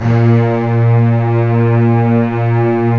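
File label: A#2 MEGA STRINGS, ORCH; ORCH.COMP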